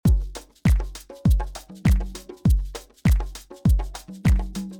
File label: shaker